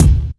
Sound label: rich top end bass drum single shot d sharp key